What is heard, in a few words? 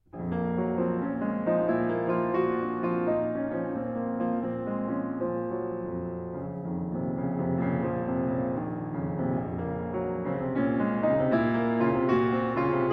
piano sonata